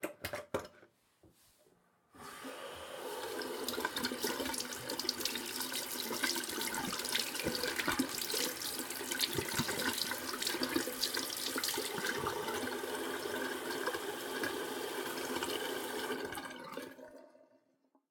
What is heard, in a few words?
washing hands inside a bathroom mostly empty soap pump sink on then off